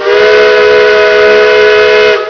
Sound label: chime